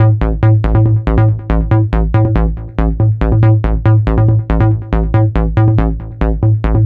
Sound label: NRG Bass, Trance